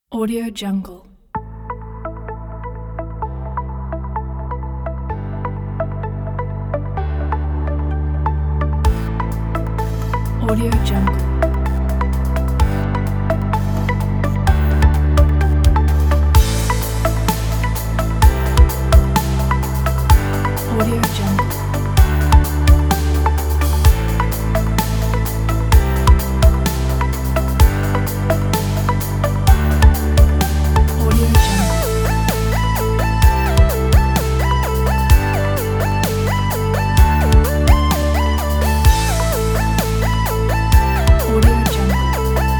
the corporate

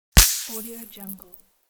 air blast explosion

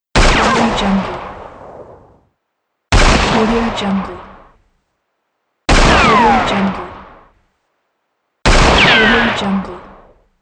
spaghetti western gunshots

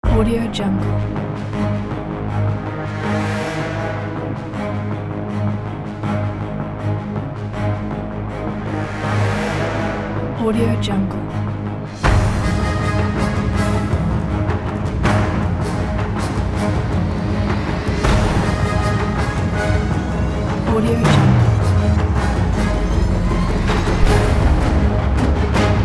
epic cinematic music

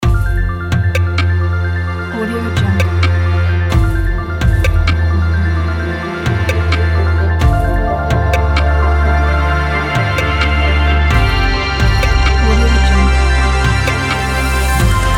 modern orchestral ident; raspberrymusic

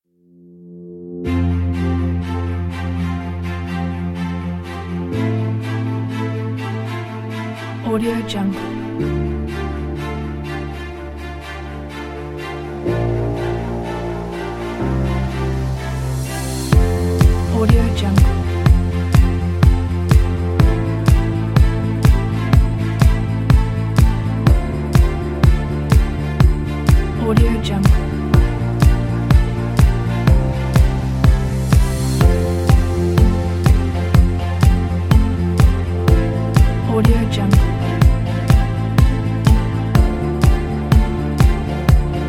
winter holidays

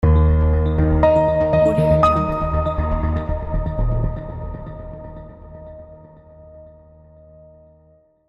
piano logo

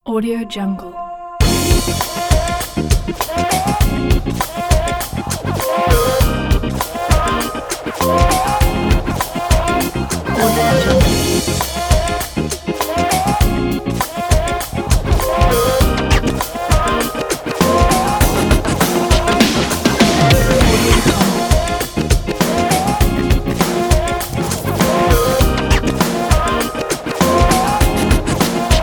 hiphop breakbeat; bodmusic

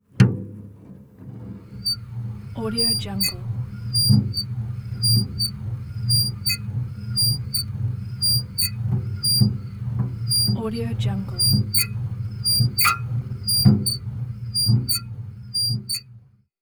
squeaking wheelbarrow

